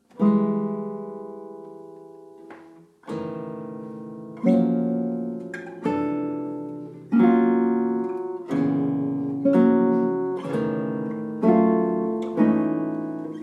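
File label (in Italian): Elegia cromatica ukulele; Elegia cromatica uke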